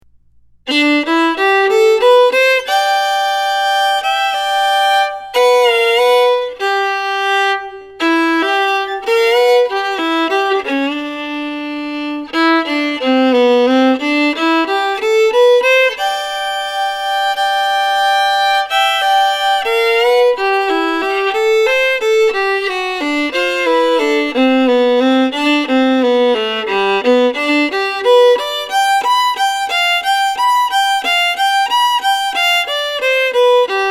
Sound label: Wandering Boy slow